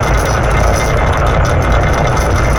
Door Large Stone Loop; Doors

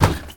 Street Ball Dunk Nylon, Street Basketball; SFX Kit

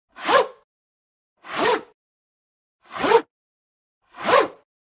Generic Slide; boom library; The Interface

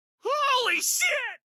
town human road; Blind Drive

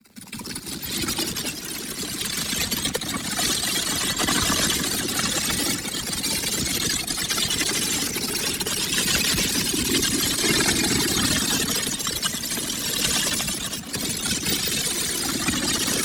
ABILITY CHARGE Crystal Shards; boom library; Matter

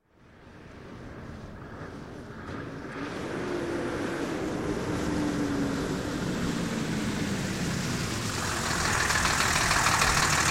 Diesel Truck, Pull Up, Shut Off; Sound Ideas 2000 Series

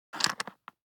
DICE on Cardboard, Grab, Standard, 6 Six Dice; Samples; ArticulatedSounds; DICE Sfx Party (Extended)

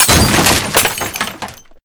pub attack window brake; DeadFright

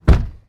car door close; vehicles; NS Studios - ultra sound collection

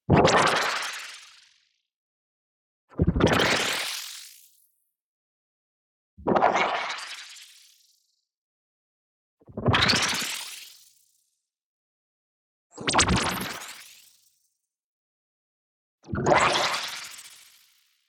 WOBBLE Laser Pitch Shift Delay; boom library; Anime Essentials; DSGNMisc

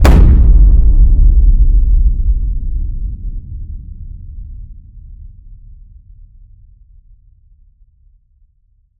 HIT Boom, Cinematic Hits Designed

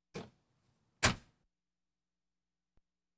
Automobiles various

